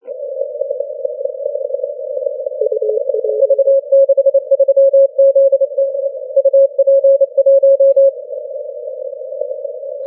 30m cw

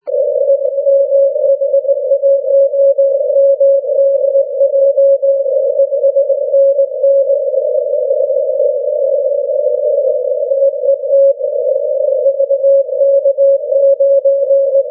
80m cw

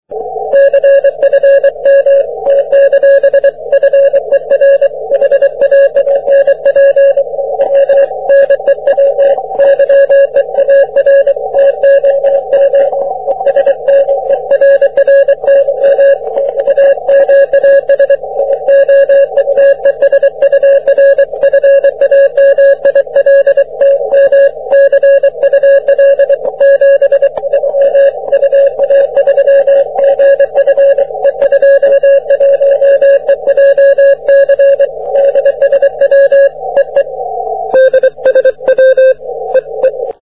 40m cw

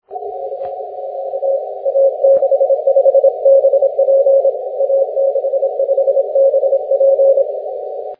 6m cw

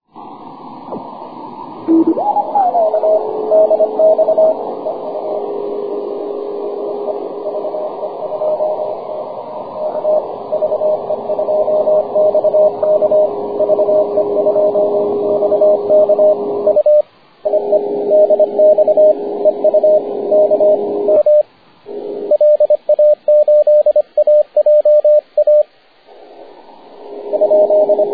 6m cw

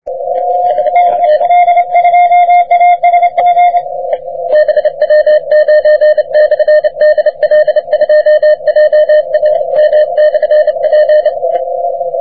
40m cw

